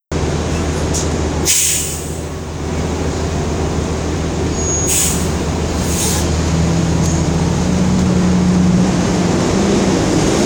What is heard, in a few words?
Air Brakes